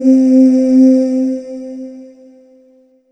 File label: Korg M1 Angels C4